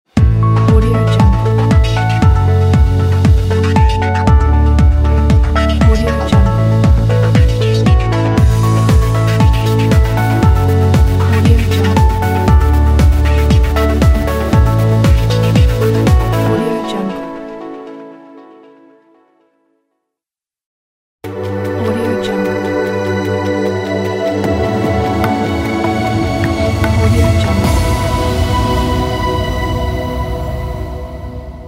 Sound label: inspiring logo pack